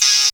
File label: synth, Dance Synths Trancers; ProSamples vol.37; Best Service